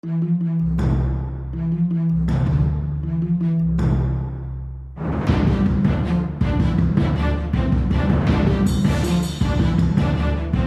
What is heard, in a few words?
Opening Menu, Soundtrack; Sid Meier's Civilization 4 Complete; Warlords; Barbarian